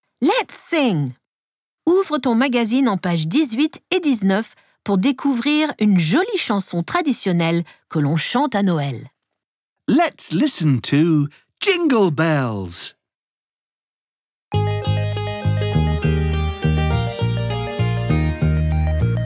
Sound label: Let's sing Jingle Bells; I Love English for Kids